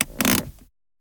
spin Button Click; wolfcub

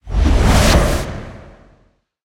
chest Coins Won; lost relics

